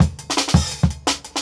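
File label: Jungle Breaks, 169 bpm; Walls; Original Jungle Breaks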